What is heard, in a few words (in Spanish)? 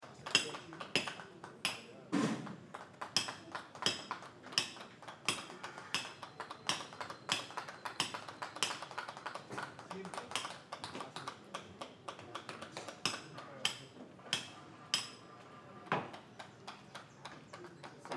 TALLER DE ARTE; PUCP